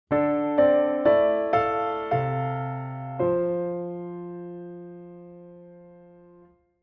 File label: piano